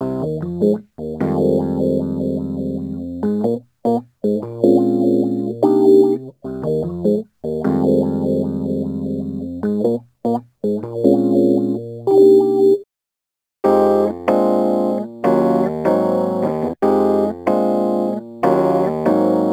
Rhodes Attacks; Ueberschall

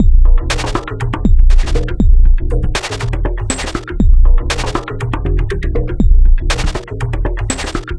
Shatter Voc Beat, Drum Loop; Sonic Foundry; The Electronic Music Manuscript Collection; Drum Loop Structures

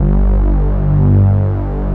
SYN KEY SOFT; Pro-Rec - Synthsonic; Z1 PADS

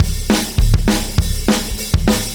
Beat Loops, 203; Zero-G - Monster Beats

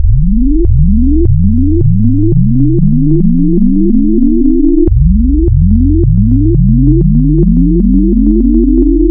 Add Sine 3N A, wavetables; Architecture 32

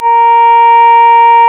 Synclav FM, WIND, A#; Big Fish Audio

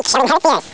desert voice; Amiga Soundtracker Sample Packs

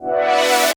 Junk Funkee, Underground UK House & Electro, Pads; Sonic Foundry (Sony Creative Software)

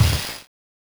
Crash Cymbal; Genesis

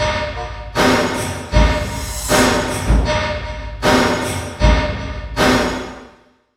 Industrial Loops

